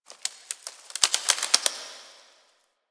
Foliage Rustle; Warframe; Quests; TheNewWar; Ambience; Zariman

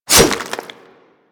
Swipe; Warframe; Infested; Charger; Attacks

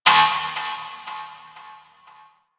Ship Energy Knock; Warframe; Corpus Ship Remaster

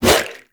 Sword Swipe, Whooshes; Warframe; Weapons; Melee; Pacifist Sword And Board

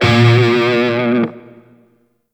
Grineer Shawzin Flourish Oct One A Sharp; Music; DE; Warframe

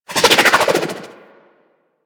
SMG Projectile By; Weapons; Warframe; Tenno; Sundial

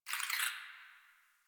Tree Critters; Warframe; Duviri

